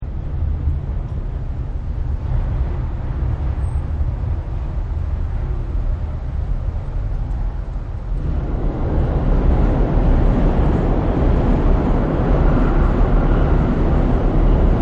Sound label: Train Roars Past; Trains; Sound Effects:Vehicles; Partners In Rhyme